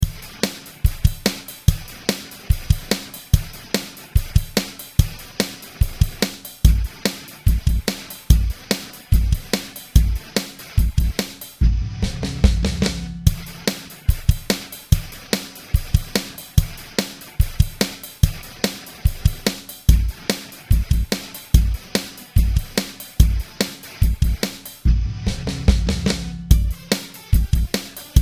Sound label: Big Kick Example